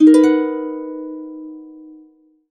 harp chords, B maj7; USB Soundscan vol.62; Complete Harps; AKAI

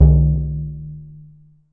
EDGE TONE, Surdo; E-MU Producer Series Vol. 2; More Studio Essentials; Composer

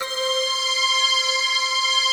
CYMME C5

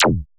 ZAP ME; HIQ+ZAPS

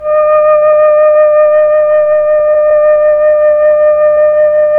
FLT, Melotron Flt, Tron Flutes; Roland LCDP09 Keys of the 60s and 70s 1

